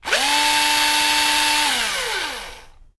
Drill Loop

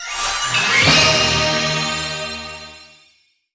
Master Grade Up

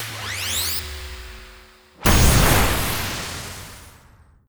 Lifeball Hit

